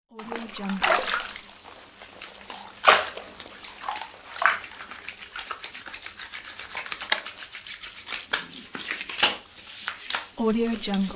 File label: man taking a bath